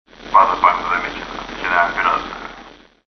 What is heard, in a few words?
radio; Dystopia; broadcast